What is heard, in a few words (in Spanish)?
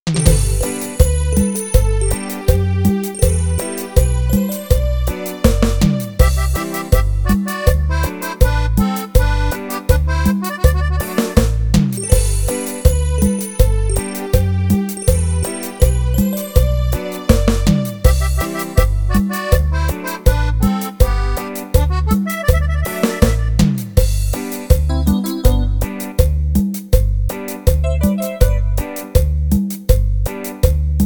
Ladron Cumbia